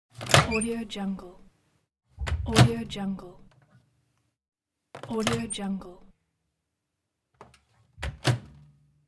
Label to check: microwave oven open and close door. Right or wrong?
Right